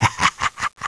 laugh

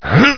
jump; duke

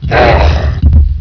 death; statue

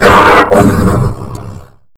sleep death; sleeper

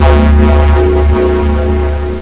use, belt

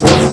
tech open, doors

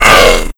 pain; sleeper

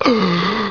gasp

